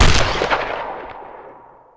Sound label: attack; ult; muerta